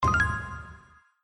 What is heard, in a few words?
COLLECT SHUFFLE; Monkey Spin